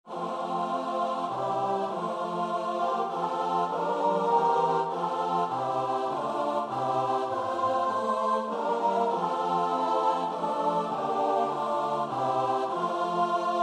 alleluia sing, choir

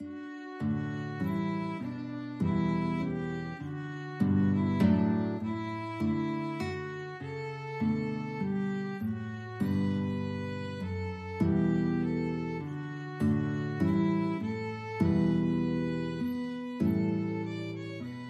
guitar violin, duet; hereford

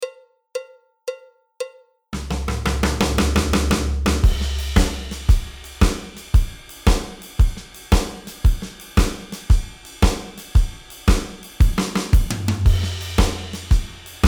shuffle groove, drums

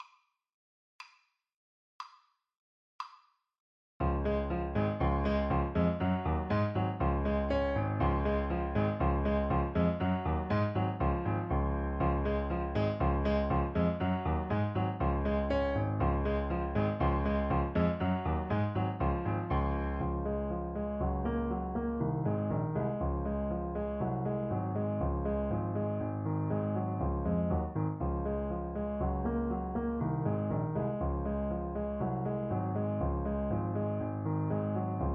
hummel ecossaise, Saxophone

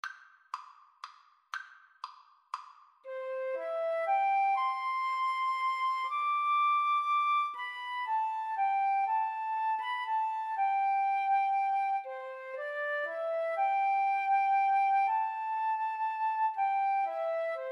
morning has broken, Guitar Flute Duet

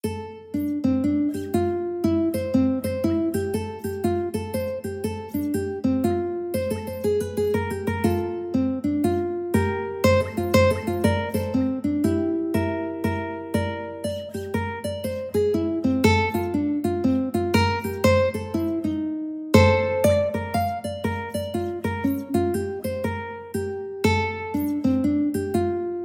ukulele

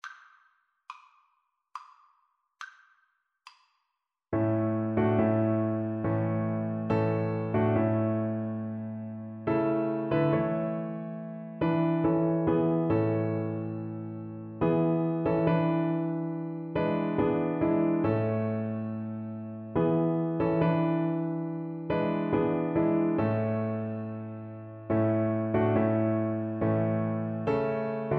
cello

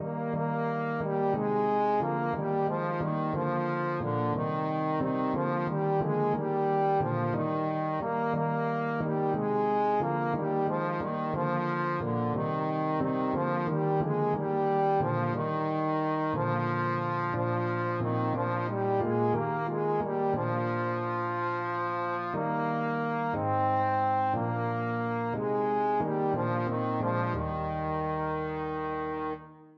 carol trombone

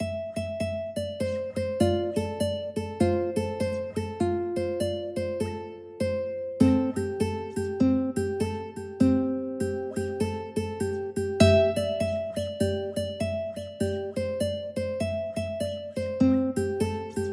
ukulele

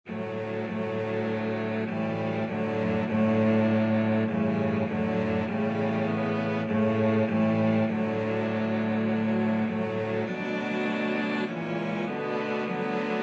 cello quartet

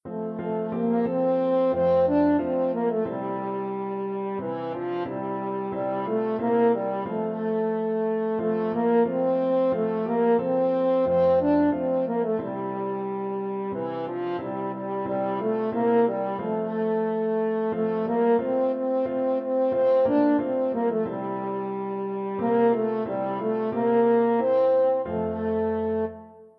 christ is coming, french horn

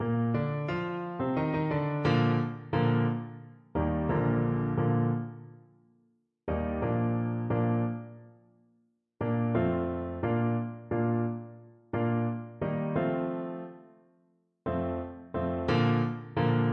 Tuba

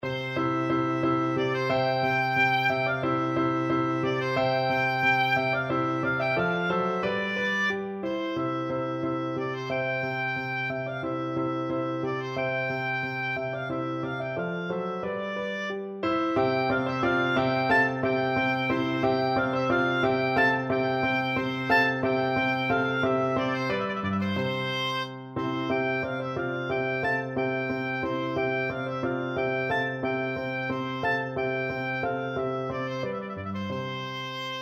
vivaldi spring, oboe

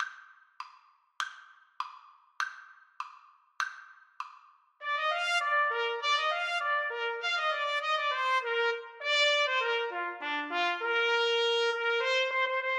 grand old duke trumpet duet

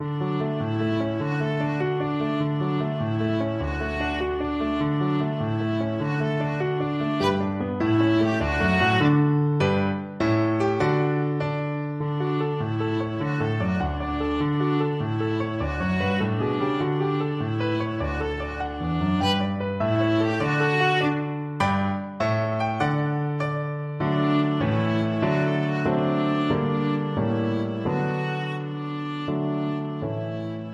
viola

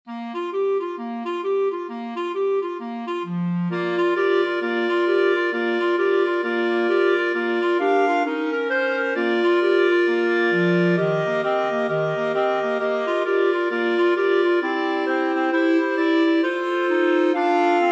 jingle bells boogies, clarinet quartet

